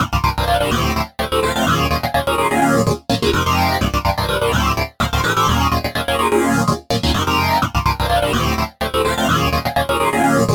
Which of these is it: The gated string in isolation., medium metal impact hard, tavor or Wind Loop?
The gated string in isolation.